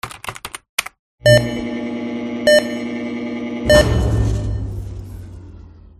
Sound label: cell control